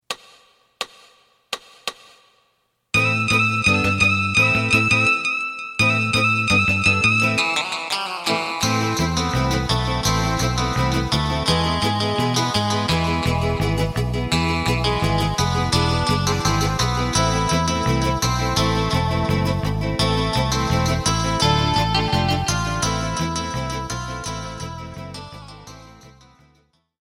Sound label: drum